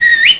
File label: whistle